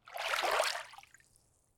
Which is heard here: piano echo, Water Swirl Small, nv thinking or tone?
Water Swirl Small